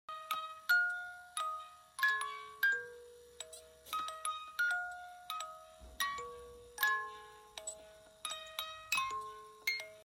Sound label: Music Box Wind Up Happy Birthday